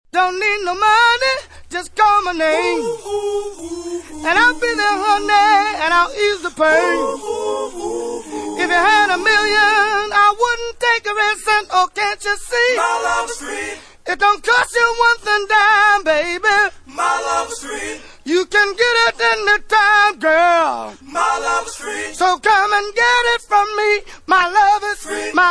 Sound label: acappella